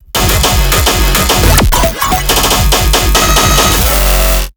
F Color Bass Loops

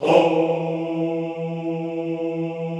"houuu" E2, classical choir; Best Service; Extended Classical Choir

